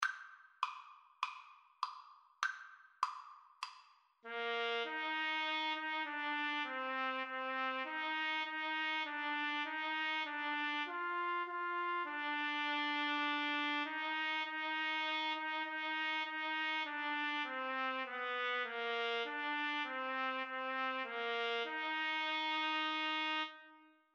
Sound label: Trumpet French Horn Duet